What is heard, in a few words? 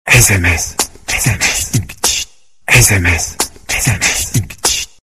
sms sms sms ringtone